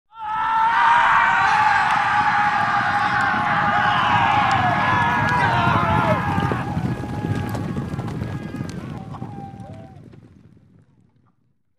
The cavalry is shouting, riding horses

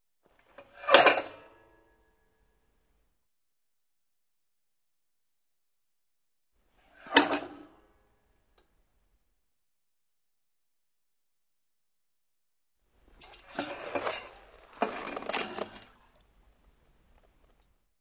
Motorcycle is put on center stand

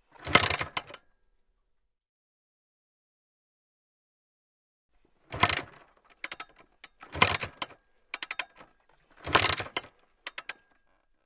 Motorcycle not start

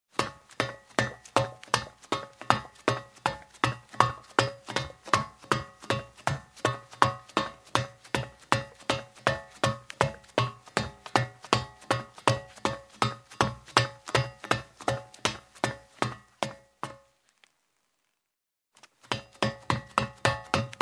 Running on the metal floor in shoes; steps

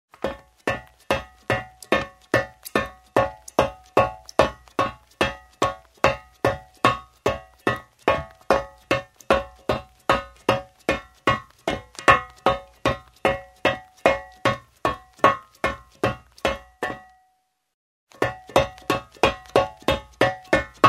Running on the metal floor in shoes

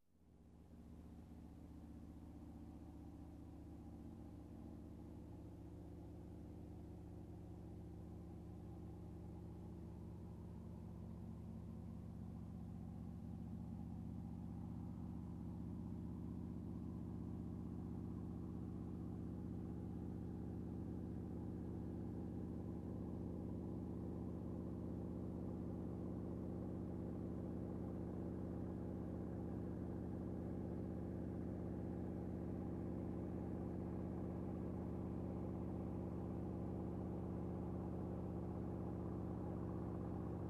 Motorcycle approaching, turning off

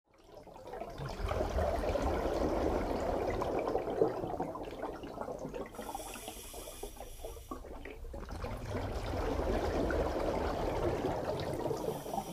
Diving, a man swims underwater

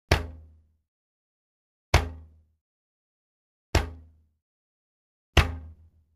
Bow, arrow hits the target; cold weapons

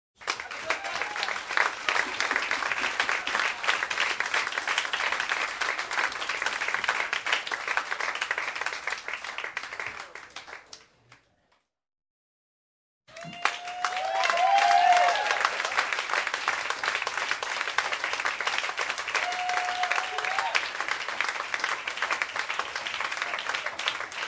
Applause from a small crowd indoors; standing ovation

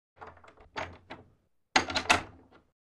Wooden door opens, closes

